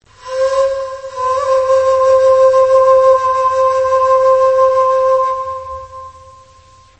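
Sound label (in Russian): флейта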